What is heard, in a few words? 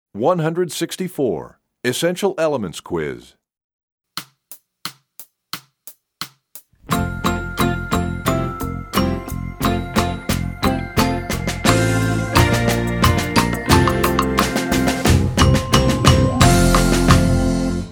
Percussion; EE Quiz; EE2000 Book1